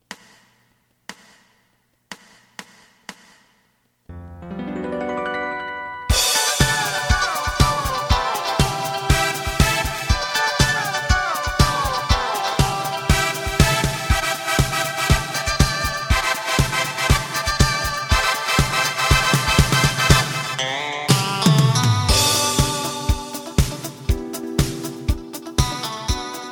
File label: bass